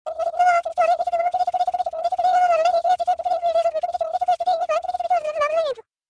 funny message notification sound effect

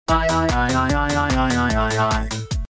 funny hip hop party notification music sound

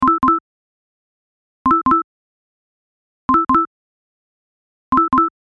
ringtone

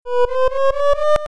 odd tones

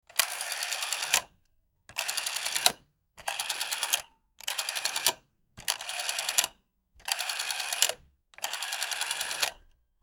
old rotary phone

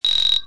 doorbell ring

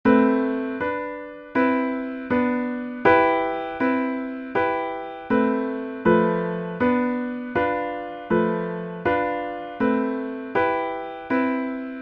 sad times piano ringtone

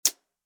hi hat

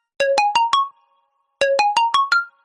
notification

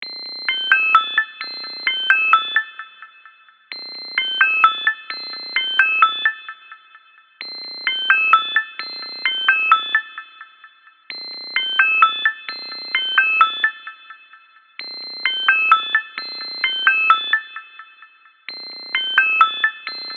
phone ringtone vibro

